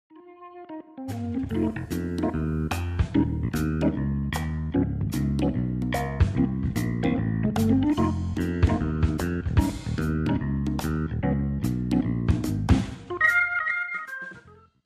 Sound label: comedic